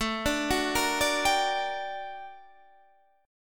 G Minor Major 7th, A, guitar chord

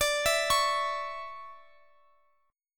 D Major 7th Suspended 2nd Guitar Open F, chord; arpeggiated; x,x,x,21,19,20

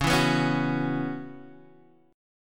Db Minor 11th, Dbm11 chord; Dbm11 strummed; x,4,2,4,2,2; JGuitar

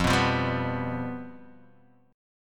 F Suspended 2nd Flat 5th, Guitar, Half Step Down

